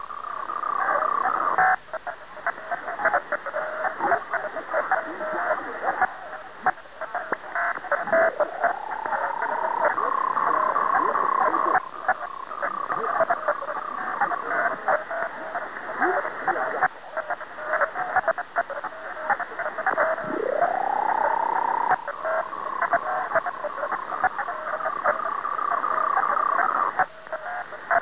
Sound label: vocoder; Unid Vocoder 27491kHz